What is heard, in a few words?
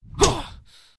daemon attack; propack